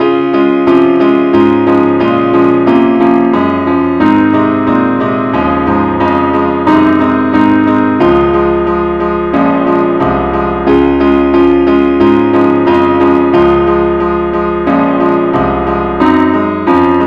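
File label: keyboard only key C sharp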